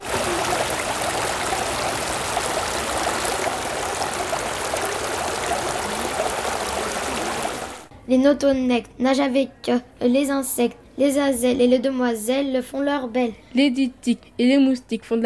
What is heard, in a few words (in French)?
nageons